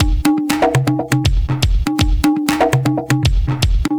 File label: Tabla Beat; Sonic Foundry (Sony Creative Software); Myths of Technology; Composite Beats